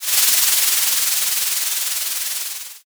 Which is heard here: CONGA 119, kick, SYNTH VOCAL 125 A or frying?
frying